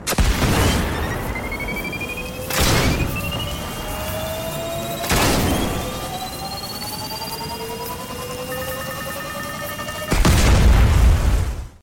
cannon long; Scorch

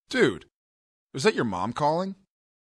Jazz

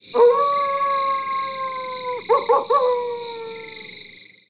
night wolf

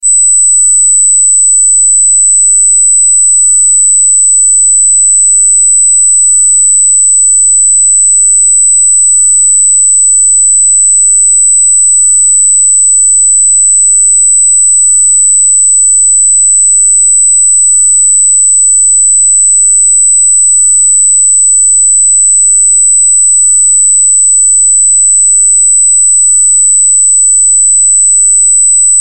high frequency; ringtones

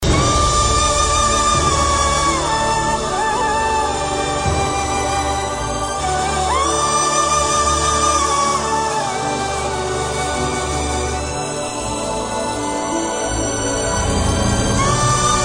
mystic india flute; ringtones

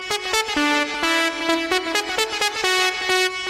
ring tone, dance

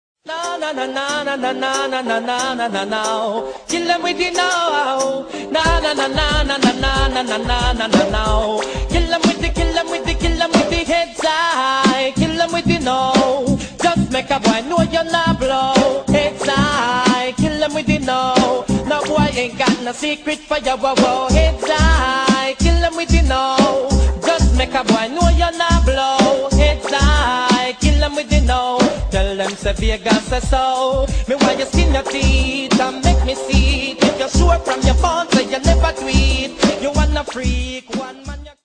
reggae classic

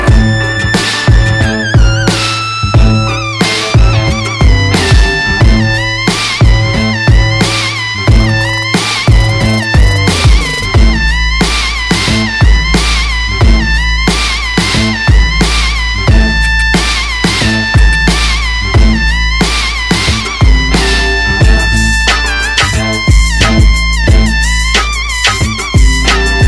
Funky Trap Ringtone

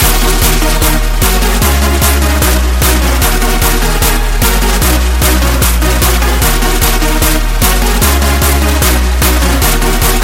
nice trance, ringtones

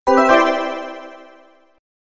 Door Open; Sonic R

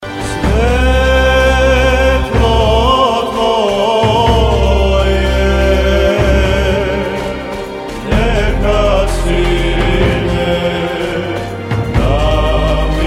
serbian religious

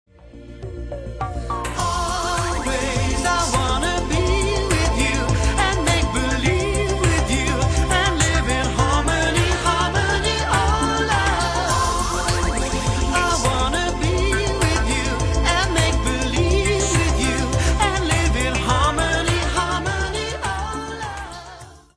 chorus

